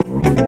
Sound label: C STAB; Loopmasters; Funky Underground Vol 2